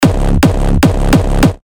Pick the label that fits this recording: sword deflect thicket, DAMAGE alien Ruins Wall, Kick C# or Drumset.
Kick C#